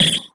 Multimedia Internet CD-Rom Flash Buttons Hard

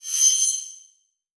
Multimedia Internet CD-Rom Flash High Pitch

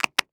Container Lock Lid Plastic; household

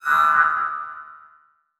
Transition Bell Hit; Production Element Title Transition

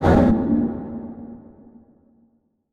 Transition Blast Impact; Production Element Title Transition

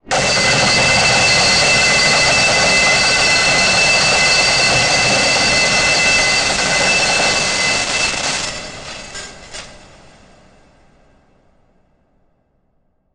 Title Transition Stab Dark Long

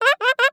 Animal Primate Ape Chimpanzee Chatter; animals; AdobeCC

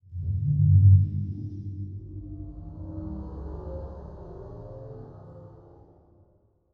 Title Transition Swell; Production Element; AdobeCC

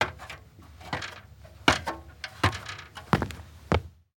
Industry Ladder Aluminum Ladder Climb Down Short

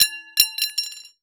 Horror Scary Pipe Metal Dropping On Cement

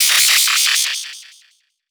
Transition Electrical Surge; Production Element Title Transition

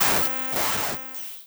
Title Transition Electrical Power Down; Production Element Title Transition

